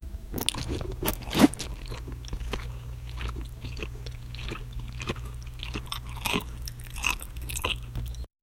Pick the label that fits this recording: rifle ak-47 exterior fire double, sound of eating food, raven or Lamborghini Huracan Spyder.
sound of eating food